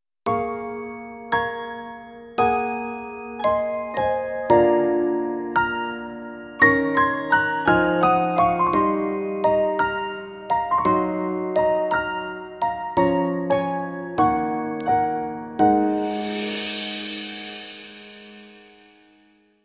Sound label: applause